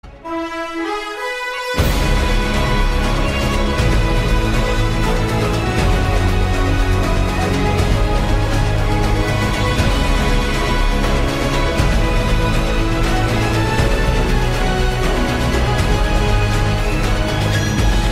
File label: instrumental; kill la kill